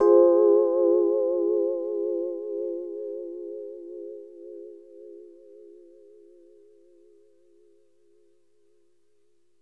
rhodes Fm; porkys adventure

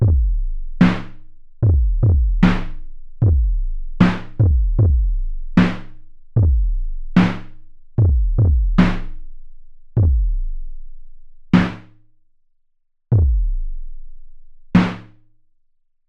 synthesized drums solo; porkys adventure

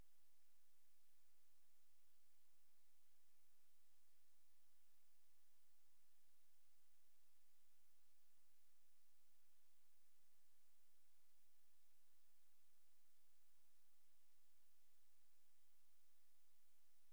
New bass; radio 101

